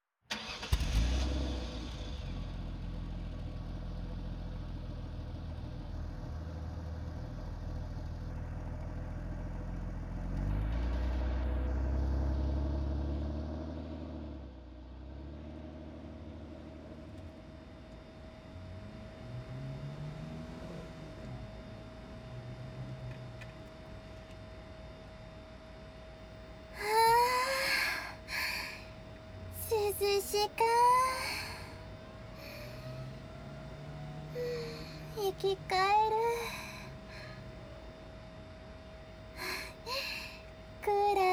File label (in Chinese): 干布摩擦; 雪儿和酷炫驾驶; 妖怪乡愁谭 ～雪女 小雪～